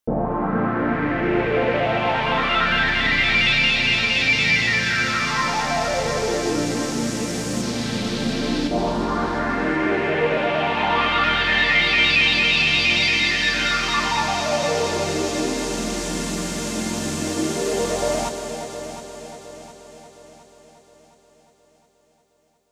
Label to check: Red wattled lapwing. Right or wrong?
Wrong